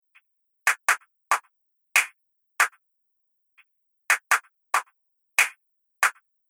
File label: 140 Bpm Techno Elements; Percussive And Rhythmic; Seamless loop